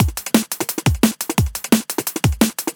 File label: DNB BREAK